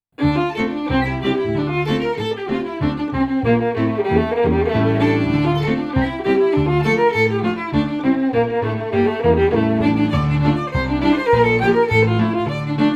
FIDDLE PIANO; Jink & Diddle Book o' Tunes 2021

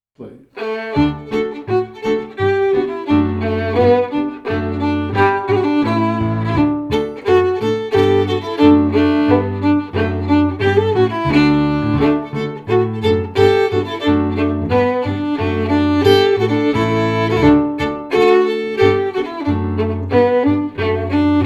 March FIDDLE PIANO; Jink & Diddle Book o' Tunes 2021

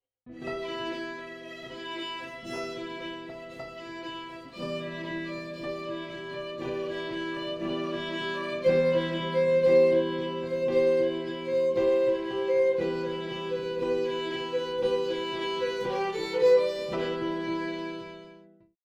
Interlude FIDDLE PIANO; Jink & Diddle Book o' Tunes 2021

